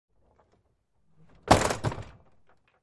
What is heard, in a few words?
Metal Trailer Door, trailer